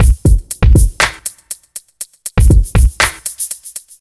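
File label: Ambient Stream; Fashion Studio Paris Collection; nds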